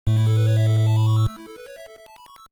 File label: Treasure Chest; Legacy of the Wizard; NES